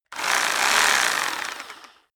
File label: rock drill hammer rev double; soundpool